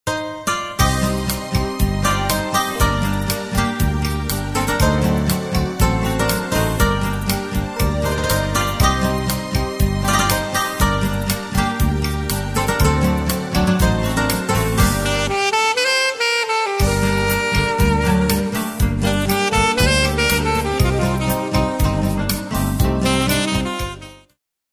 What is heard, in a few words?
sax